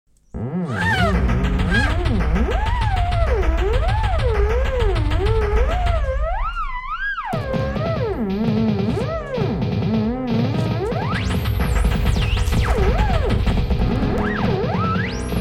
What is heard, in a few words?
Trio for Therimin & Electric Keyboard